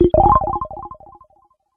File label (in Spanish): Moderno suave, Ringtones; tono de mensaje; Notificaciones